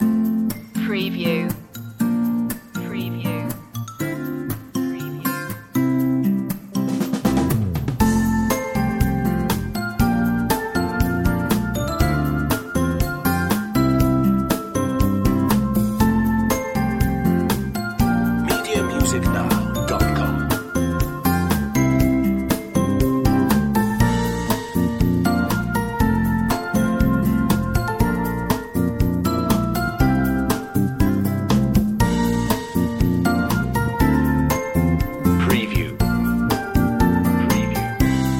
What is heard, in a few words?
a cheerful morning loop